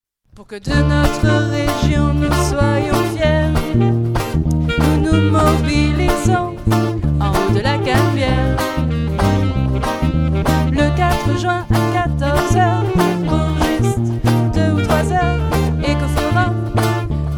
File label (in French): Manif jingle